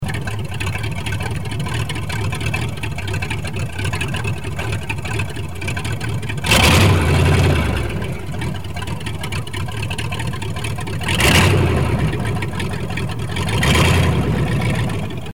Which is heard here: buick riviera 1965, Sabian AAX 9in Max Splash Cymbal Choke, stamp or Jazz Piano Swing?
buick riviera 1965